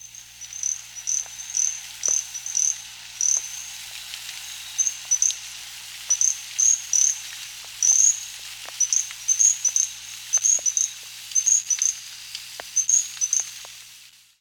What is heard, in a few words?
little grass frog